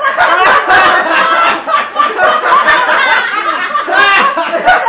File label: group laugh